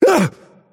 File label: pain; dota; phantom assassin